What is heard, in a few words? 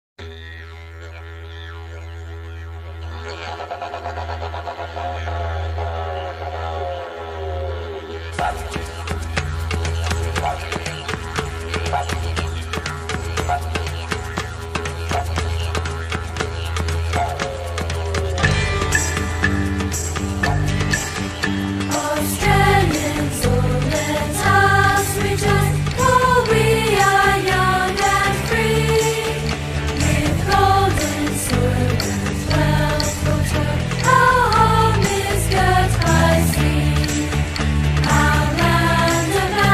Australia National Anthem lyrics